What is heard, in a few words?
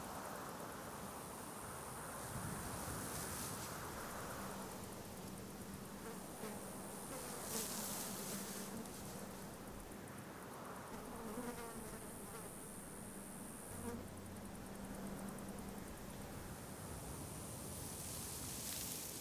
Ambient - Desert; Turmoil